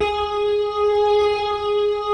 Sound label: G#, The Piano; InVision Interactive